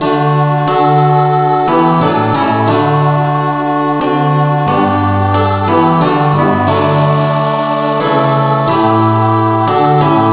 no vocal key d

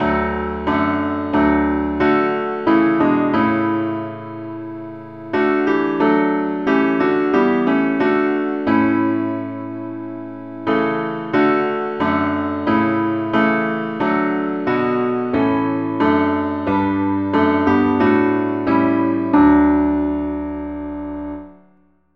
parts only, key D

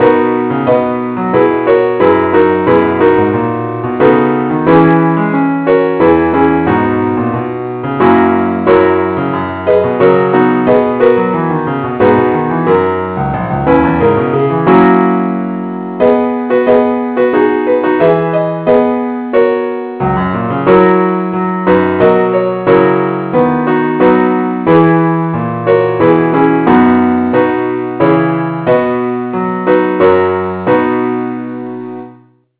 keyboard only key B

